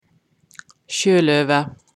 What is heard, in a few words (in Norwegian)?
Sjøløve